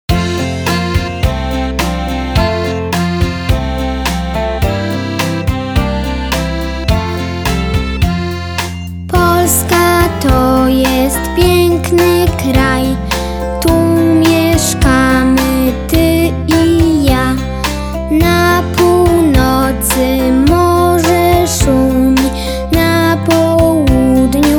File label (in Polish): Polska to jest wokal